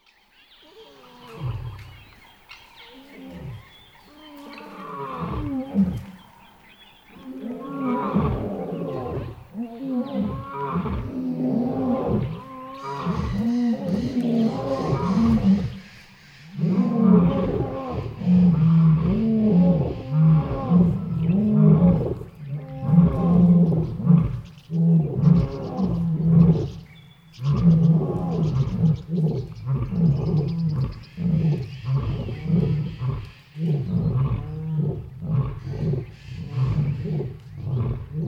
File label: Lions